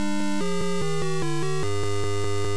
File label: Title, Beeper; Crystal Castles